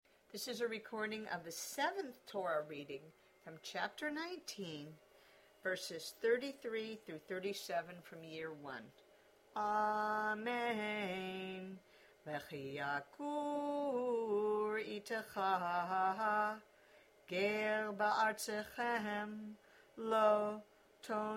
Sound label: Torah